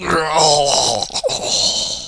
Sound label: Die